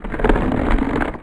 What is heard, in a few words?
Plant Giant Stalk Bend; SFX Kit; Foley - Misc